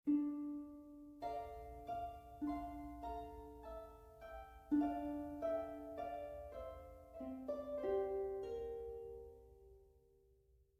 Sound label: harp